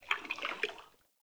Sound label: Under City Cavernous Water Lap Rock